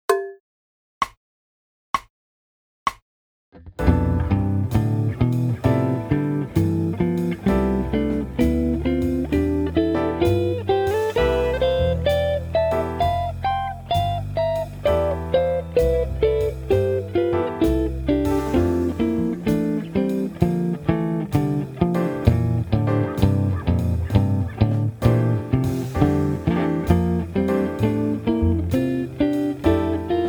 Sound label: major 7 exercise quarte contemporaneamente